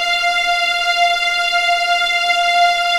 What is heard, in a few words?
F5 LEG F, VIOLINS; East West; The Ultimate String Collection